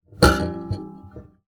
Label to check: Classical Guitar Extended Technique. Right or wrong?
Right